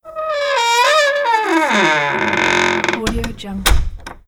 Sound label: creaky solid wood door closed